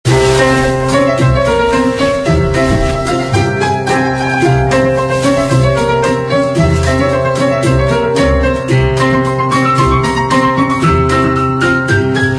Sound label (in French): Musique arabe